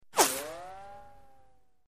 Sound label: Right To Left Tonal, bullet bys